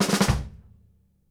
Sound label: KICK SNARE, Live Snares